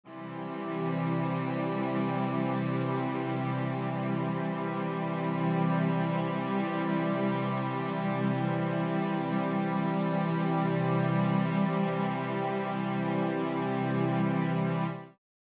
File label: C Major Chord, String Ensemble